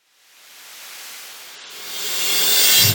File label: Sweeps Medium